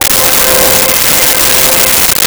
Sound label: Soundtrack C5; Nintendo; July 2020 Gigaleak